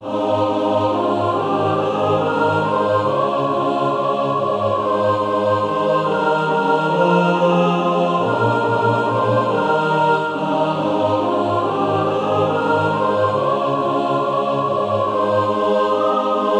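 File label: mixed, Practise Music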